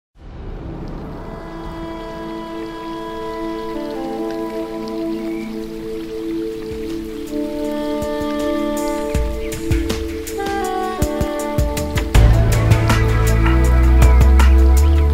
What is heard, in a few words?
ambient; Sound PooL